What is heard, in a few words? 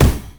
displacement flash; LoL; leblanc